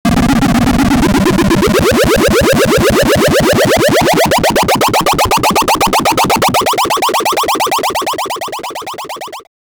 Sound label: cha ching 8bit video game